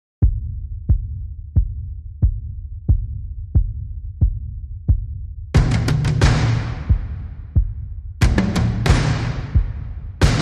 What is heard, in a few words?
Drums Only